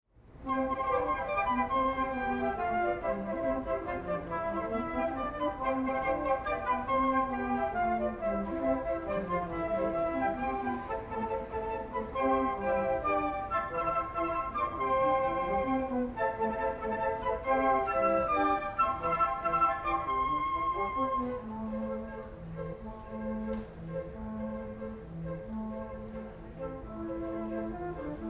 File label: Pink Flamenco